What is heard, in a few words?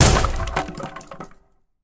Impact Door; MiSide